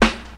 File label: perfect snare f key; snare drum samples